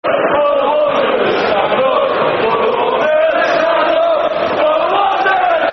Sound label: Stand Up For Wanderers, Football Chants; Bolton Wanderers Bwfc